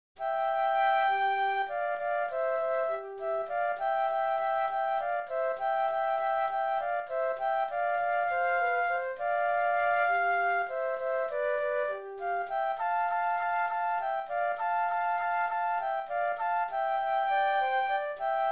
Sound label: Flute Duet